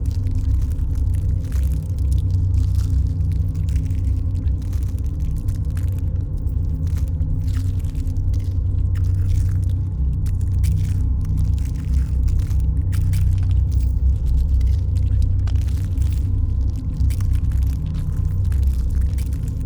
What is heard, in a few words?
pro ship mining station idle; biogenesis